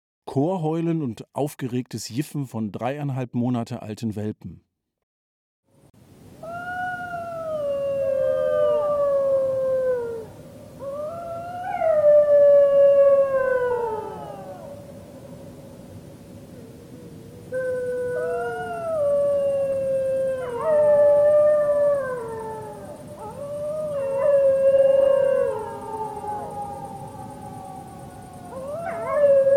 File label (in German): Welpen Kontaktheulen, Vater massregelt